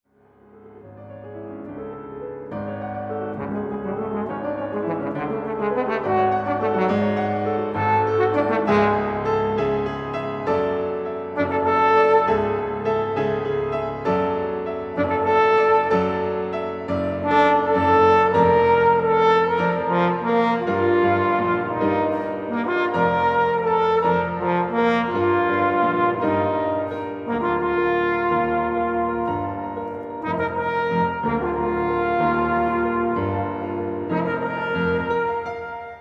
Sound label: Concertpiece for Trombone, trombone and piano